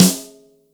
kit, Yamaha Motif-ES8

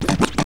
HI SCRATCH; Zero-G; Funky Elements

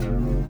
LIQUITAR; Spectrasonics; Metamorphosis

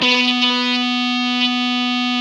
GTR Fuzz, Dirty; Club-50 - Foundations Roland

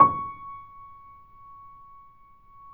PP C#5, Grand Piano; AKAI S6000 CD-ROM - Volume 2; Grand Piano 256M

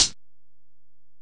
HiHat, Alesis DM-PRO

